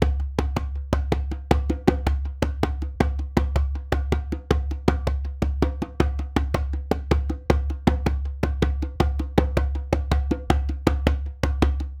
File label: djembe loop 80